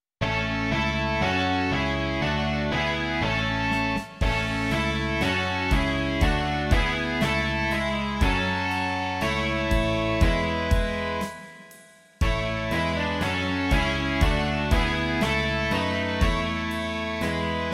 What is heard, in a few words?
rock